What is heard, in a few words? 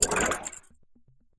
editor paint explo; Source Engine; Portal 2